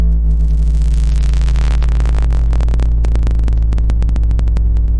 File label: bass radioactivity; Basses